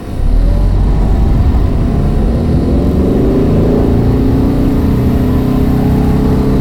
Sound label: first shift accel up, car; vehicles; garrysmod; sincar